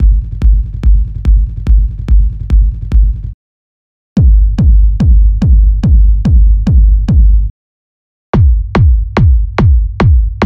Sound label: Raw Deep Techno; Soma Records; Raw Deep Techno Vol. 2